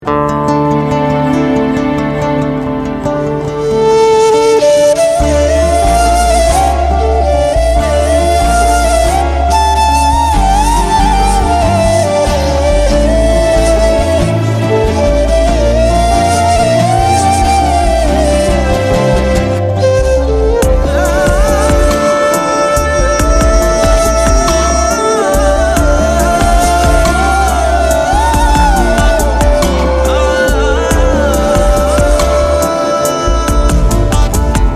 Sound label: Instrumental; Deewangi Drama Ost